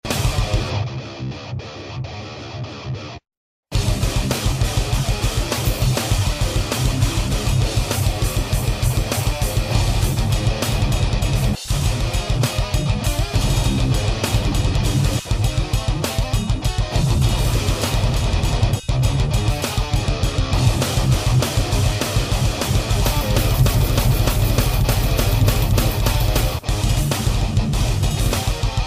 instrumental demo

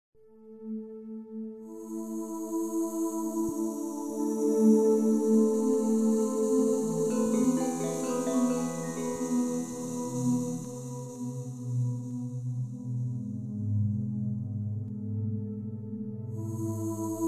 ambient; X-Bow Software; 2004 - Star Wolves

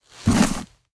mask clean; Stalker Anomaly; interface item usage

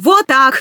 fire, human woman fight; Stalker Anomaly

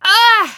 hit, human woman fight; Stalker Anomaly

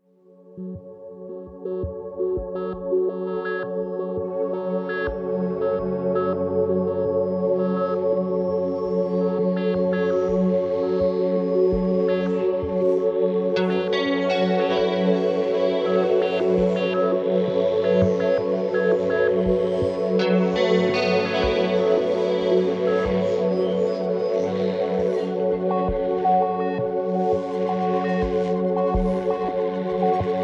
ambient; X-Bow Software; 2004 - Star Wolves

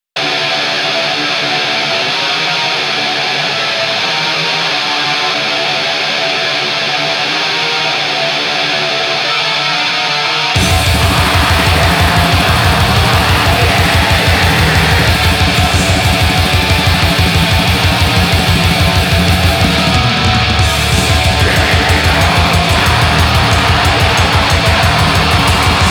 Deceased Ambience

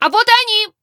attack many, human woman fight attack; Stalker Anomaly

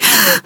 hit, human woman fight; Stalker Anomaly

